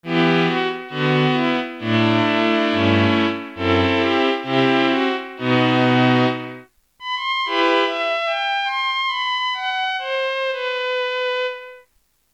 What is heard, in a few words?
violins